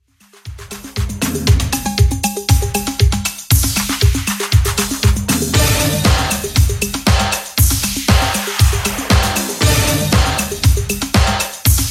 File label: 80s Redrum 118bpm